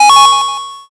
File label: coin